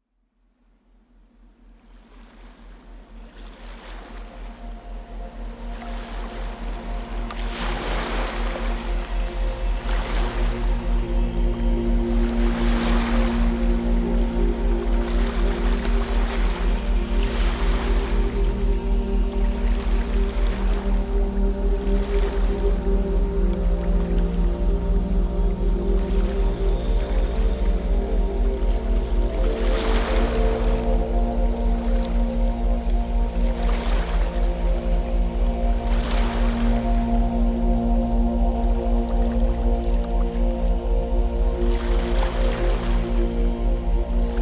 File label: Theta Brainwave Deep Insight; Spiritual Connection